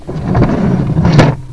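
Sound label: close door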